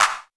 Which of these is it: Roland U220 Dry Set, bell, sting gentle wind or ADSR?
Roland U220 Dry Set